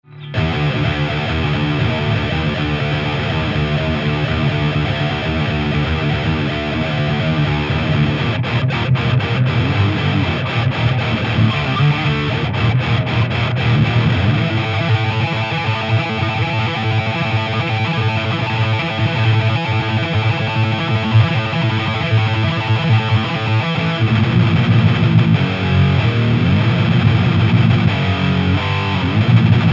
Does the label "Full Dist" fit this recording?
Yes